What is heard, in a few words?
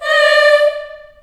D4 CHS EH, CHILD EHS, Choir; USB Soundscan vol.28; Choir Acoustic & Synth; AKAI